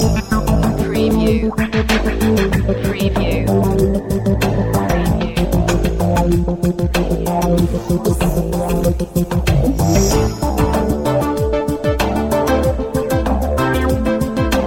menhir loop

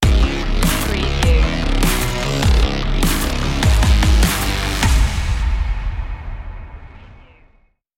hybrid human stinger

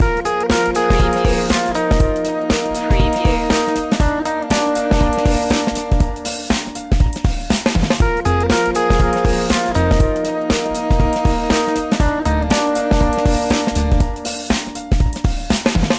pop loop

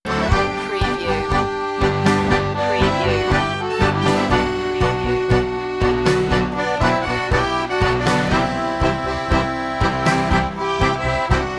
tango

